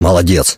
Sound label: reaction music; STALKER